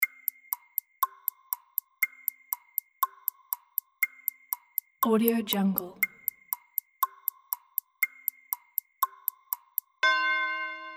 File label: countdown; شمارش معکوس هیجانی